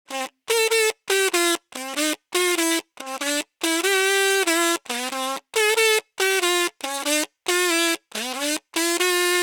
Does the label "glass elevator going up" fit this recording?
No